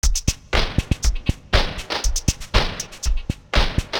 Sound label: Drama Drums (120BPM); musicradar